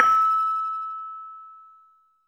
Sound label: LAMEL E5 -R; USB Soundscan vol.60; AKAI; LAMELOFO